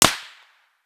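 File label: supersonic snap, bullet snap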